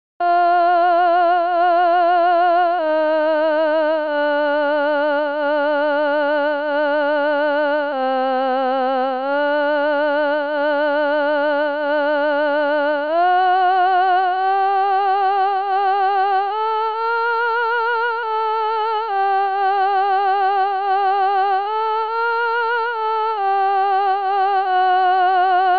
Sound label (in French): Lou Pater Hte Contre